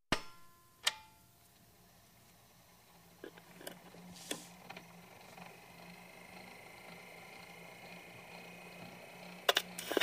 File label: Needle On Record - Hiss From Speaker, Various Record Hiss With Rotation Of Record. Switch On With Clanks. Crank Turning. Multiple Clicks, Switches.; record players